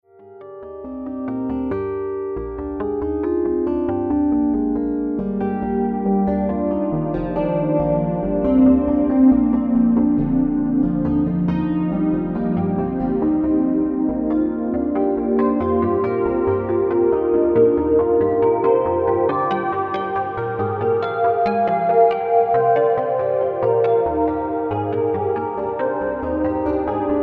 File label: dense chorusverb